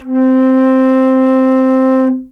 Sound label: flute alto C